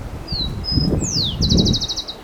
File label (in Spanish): chingolo